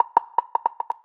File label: ambience bet Sign; dead or alive 2